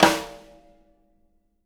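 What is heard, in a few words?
jazz snares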